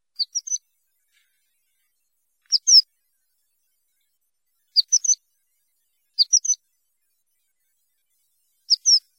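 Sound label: horned lark voice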